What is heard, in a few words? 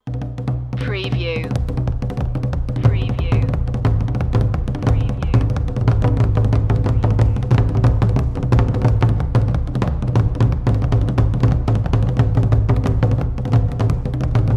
african drums